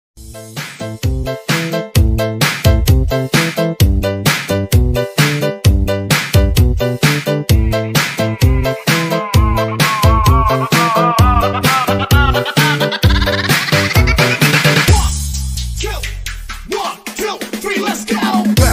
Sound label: disco bounce banger remix